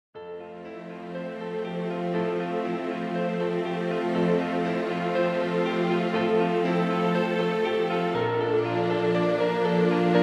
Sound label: Emotional Romantic Background Music